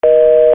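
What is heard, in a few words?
gudok, horn; Гудок; teatralnye shumy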